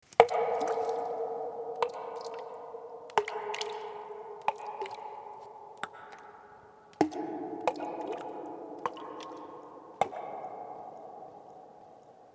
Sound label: Ambient Cave